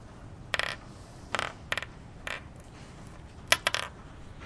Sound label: eckington high street